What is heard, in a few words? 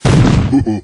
royal giant fire